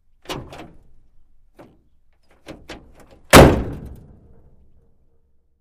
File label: Truck 2.5 Ton Front Door